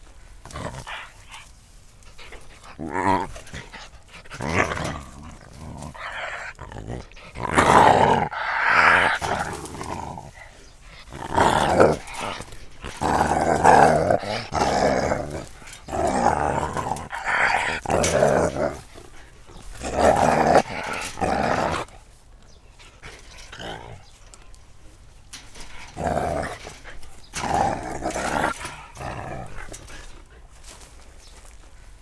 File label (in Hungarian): erősen indulatos, aranysakál; szegedi zoo 2011